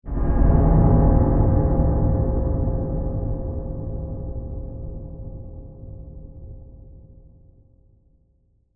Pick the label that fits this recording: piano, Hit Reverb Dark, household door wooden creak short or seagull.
Hit Reverb Dark